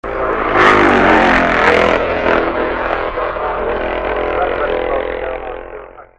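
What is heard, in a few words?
Seeley, motorcycle; 1968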